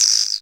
synth, Dance Synths Trancers; ProSamples vol.37; Best Service

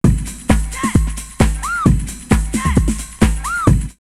Rave drumloops 132; X-Static Goldmine; 90 s Sample CDs

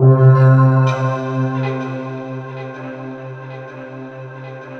GOA C 2, Korg; Back In Time Records; Korg Universe VOL-1

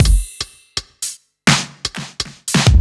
Kit Wonk, 85bpm; off the grid

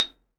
XYLOPHONE, Percussion; Synclavier; World & Orchestral; ILIO

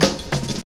fills; Sound & Vision - Gigapack I

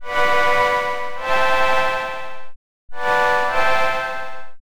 Disto Flute; Sonic Foundry (Sony Creative Software) - Rondo Brothers Trip-Hop Loops & Samples; Liberation

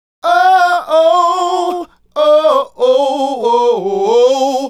Male Group, Vocal

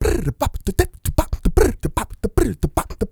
BRRRDEBOP, Vocal Planet, Blues Soul; Spectrasonics

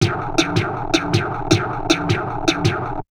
DIST, Drum Loops Crazy Processed

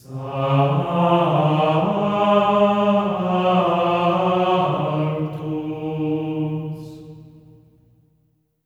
SANCTU C#4; Spectrasonics; Symphony of Voices; GR SANCTU

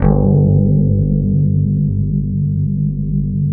BASS, Drum & Bass 160 BPM

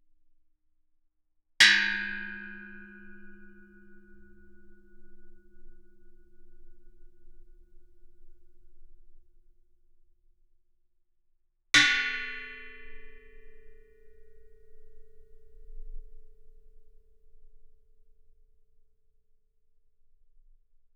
Gamelan; Propeller Island - Complete Gamelan

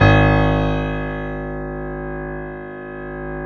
PIA PRO, Roland

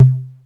sine bass, Kawai; Rubber Chicken Software Sample Bank

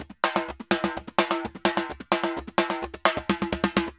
TIMBA, SAMBA; World Grooves; DRUMS; Best Service ProSamples vol.14; AKAI

